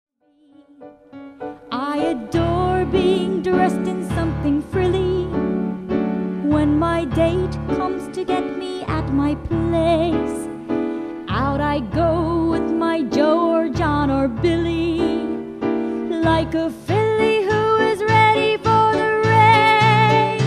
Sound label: song